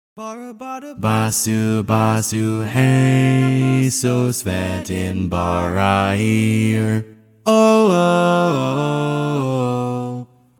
TTBB Bass, barbershop tags

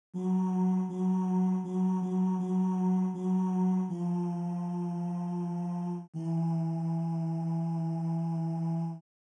Bari, Barbershop

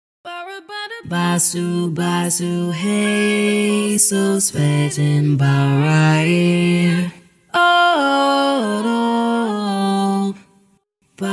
SSAA Bass, barbershop tags

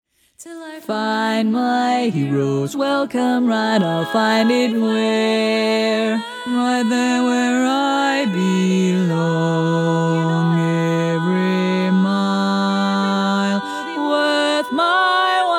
Bass, barbershop tag

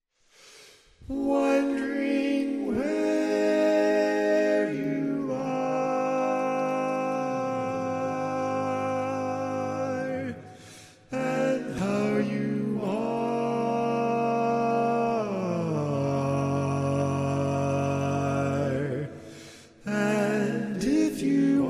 Bari, barbershop tags

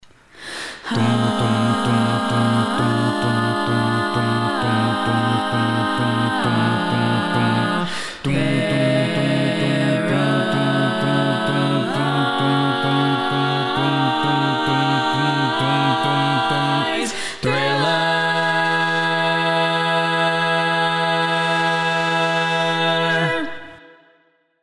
Bass

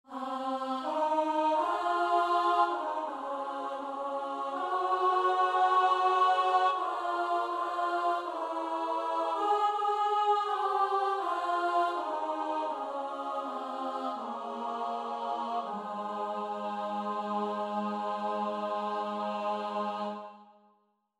Bass, barbershop tags

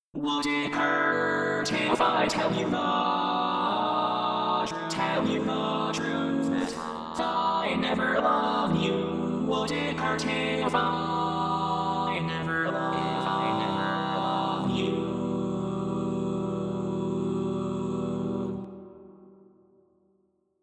All Parts, barbershop

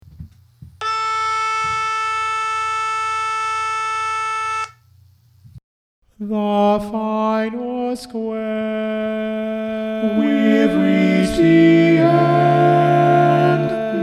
Tenor

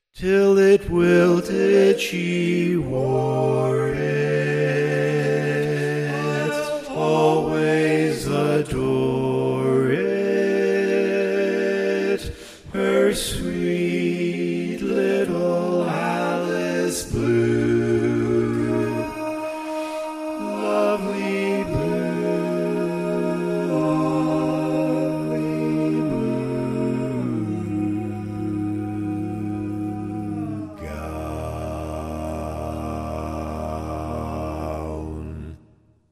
Bass, barbershop

